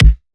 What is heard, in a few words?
kick; Slate; SD MIDNIGHT Sample Pack; smuckers